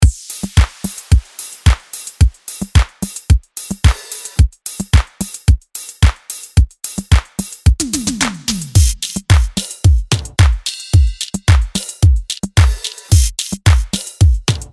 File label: drum; baltic audio; ultimate drum